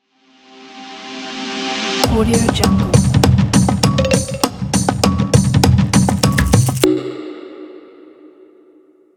percussion logo

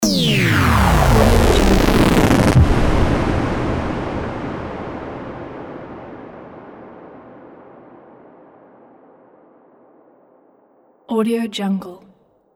power down and explosion; mountainaudio

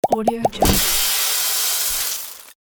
cartoon pops spill long; cinematic sound design

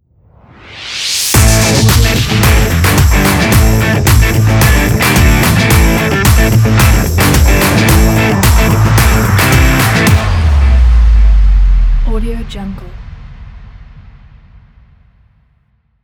rock logo

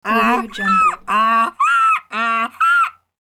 cartoon squeaky hee haws

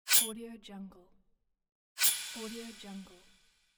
sword

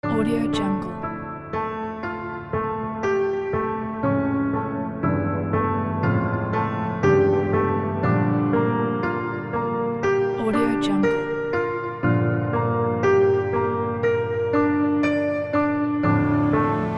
emotional sad piano; maslorisound

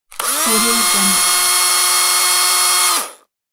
screwdriver

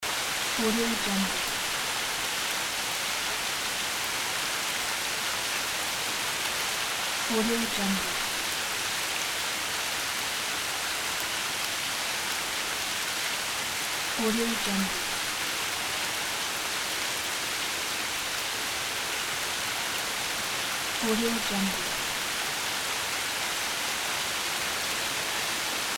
heavy rain sound

